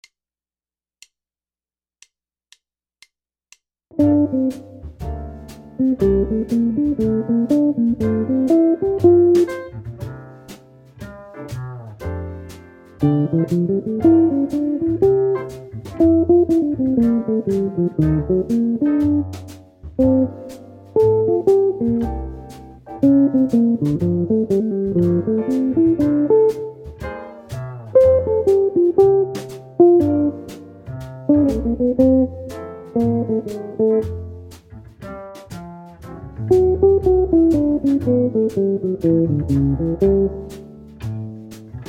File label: bebop, jazz guitar